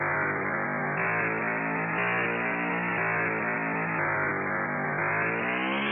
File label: gears are spinning